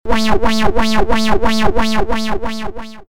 Ufo Alert Arcade

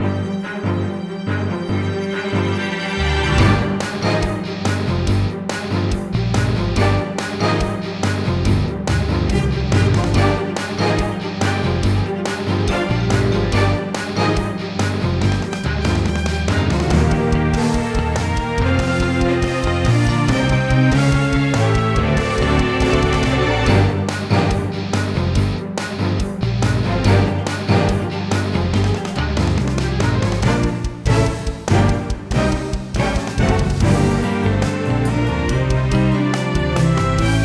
Boss Battle - JRPG (142bpm) loop; dark water slime invader; switch windows

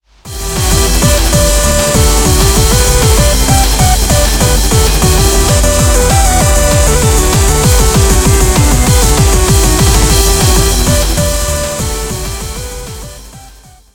BGM xfade; Invaxion

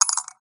Ammo Shell; Cruelty Squad

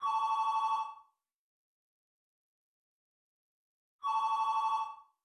Office Phone

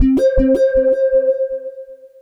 Text Feedback

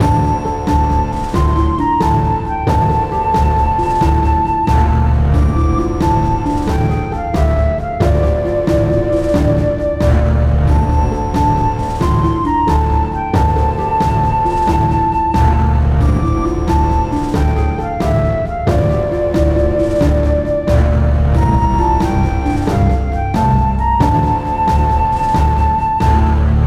Defeat; Castle Conqueror Defender; 3ds gamerip 2014

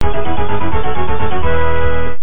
Fanfare; Pac Eight

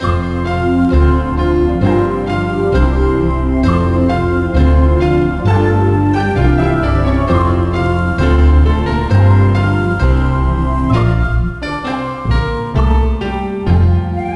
Sting Think; jeopardy; nds

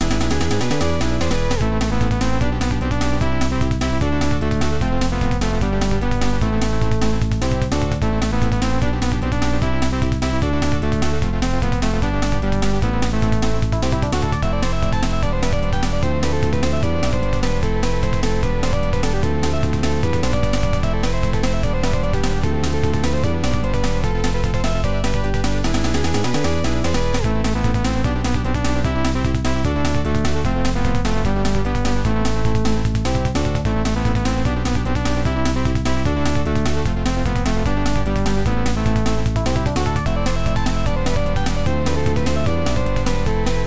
Final Battle; Shining Crystal; MSX2

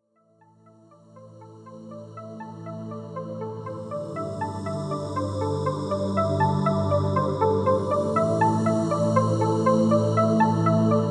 Ambient; Naev

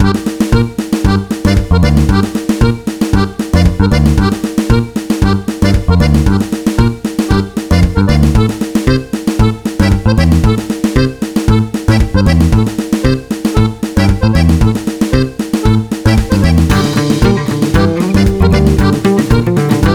Circus Funk

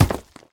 Rock Impact Big

